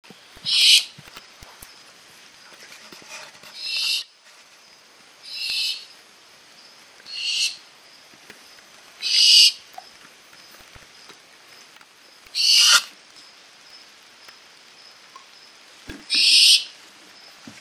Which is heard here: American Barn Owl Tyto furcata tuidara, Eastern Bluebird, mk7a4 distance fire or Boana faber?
American Barn Owl Tyto furcata tuidara